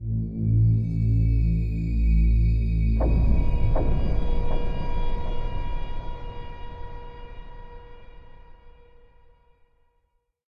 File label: Progress Stinger; Mass Effect 2; Freedom